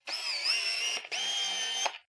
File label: pentax 100mm f2.8 focus